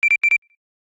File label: Pen det beep; Samsung Galaxy S4 (GT-I9500)